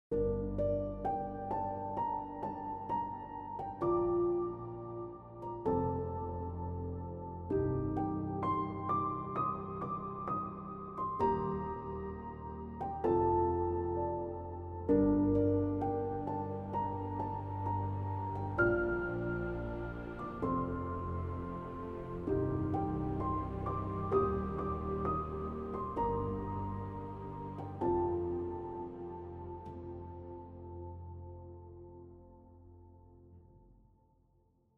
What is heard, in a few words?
Atmospheric Piano Main Parts